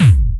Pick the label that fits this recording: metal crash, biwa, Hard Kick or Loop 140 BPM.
Hard Kick